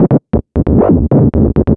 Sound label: Percussive